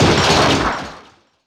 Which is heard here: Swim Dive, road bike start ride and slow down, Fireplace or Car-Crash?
Car-Crash